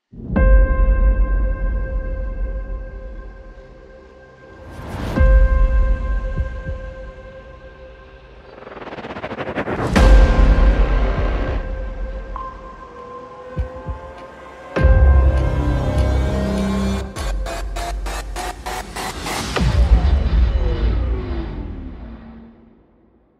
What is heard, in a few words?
Modern cinematic